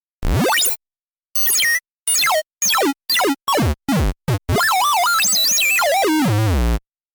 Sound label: swipe synth